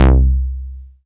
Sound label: matrix seq C2; Best Service Dream Experience